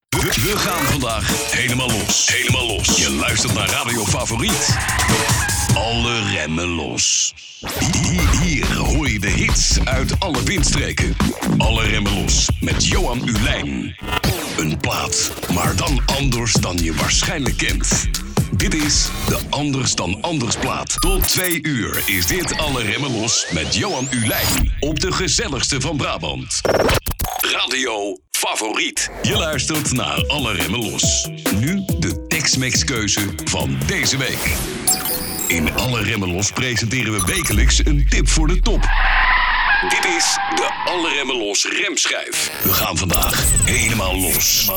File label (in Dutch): Radiovormgeving; Favourite FM; Alle Remmen Los